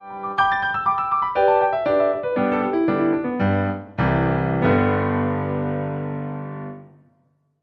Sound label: cadences